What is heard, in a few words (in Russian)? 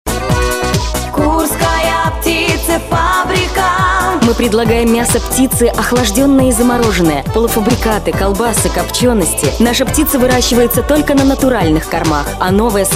Produktyi pesnya